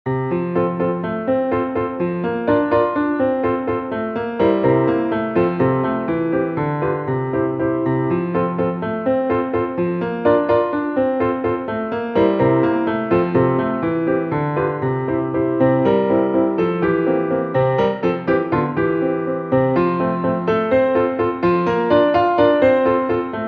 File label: schumann piano; classic; tanoshikinouhu